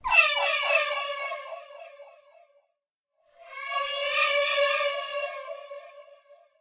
Childish Whooshes

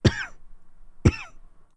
Dry Slow Double Cough

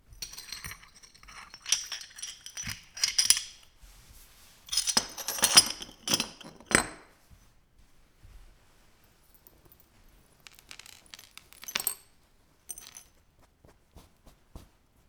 Plate

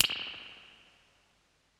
Snap

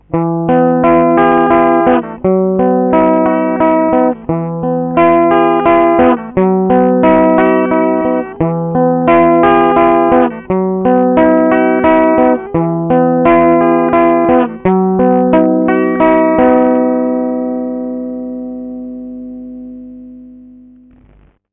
Guitar Em D

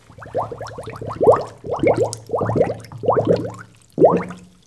Small Water Bubbles

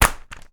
Newspaper

